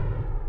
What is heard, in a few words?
ROTATE; lander